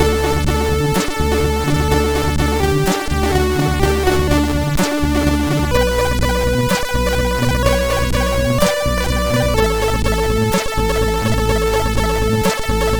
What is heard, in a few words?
Steps, sid MOS6581R4